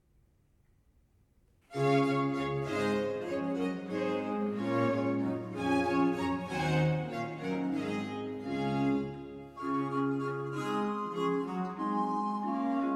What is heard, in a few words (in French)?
pour quatre voix flute et violon; messe de minuit; agnus dei